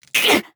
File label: Death; Paladins; Kinessa